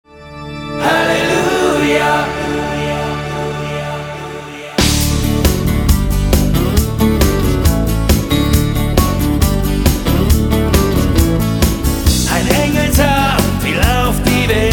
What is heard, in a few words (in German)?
halleluja